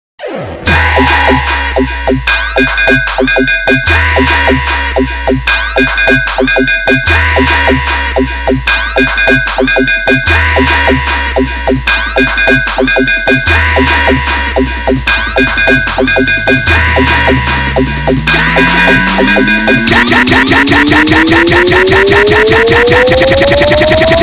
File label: SIREN COMPETITION KAAN FAAD MID BASS MIX; DJ Praveen Raj Production